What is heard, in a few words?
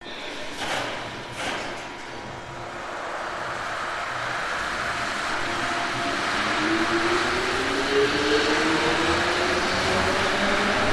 monorail start